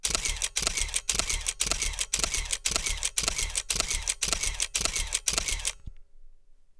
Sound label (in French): RAFALE